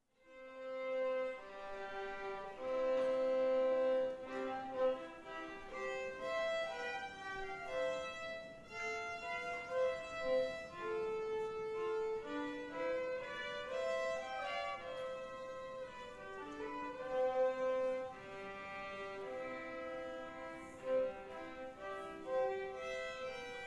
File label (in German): Violinduett